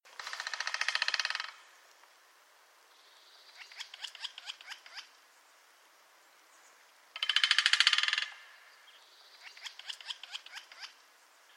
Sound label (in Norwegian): FLAGGSPETT